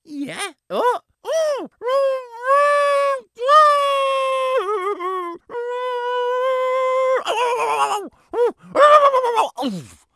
mario spincycle

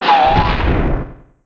breaking box

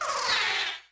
boo; nsmb

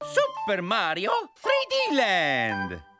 home menu icon

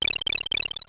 switch timer ending; smw

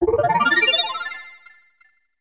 exit course pause menu

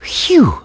phew; mario; smsunshine

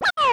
baby bowser ground pound; smw2

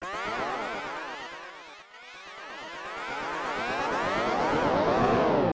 bowser flies away; smw

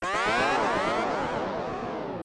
bowser returns; smw

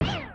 throws; smw2

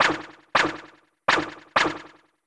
piranha plant loop

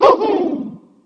metal mario

